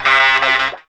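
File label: FUZGTR, fuzz guitar; E-Lab; X Static Goldmine 1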